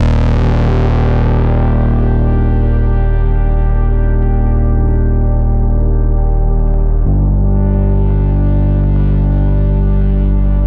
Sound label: City Ruins Stem; Epitasis